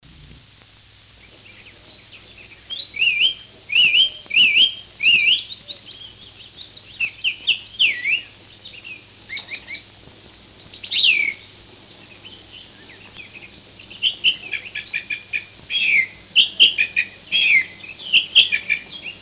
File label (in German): Singdrossel; Vogel